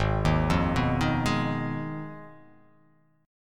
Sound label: Ab Minor 6th, Ab; Abm6 arpeggiated; JGuitar